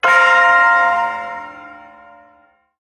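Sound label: Shawzin Pentatonic Minor Chord; Warframe; Duviri Ersatz Shawzin; Chords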